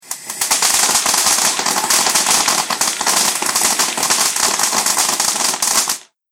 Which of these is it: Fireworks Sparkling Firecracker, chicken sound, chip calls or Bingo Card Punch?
Fireworks Sparkling Firecracker